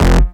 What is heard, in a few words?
Synth Shot